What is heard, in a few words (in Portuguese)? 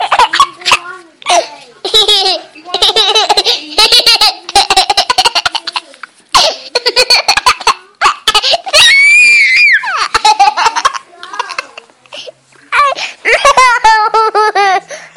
risada de crianca; toques para celular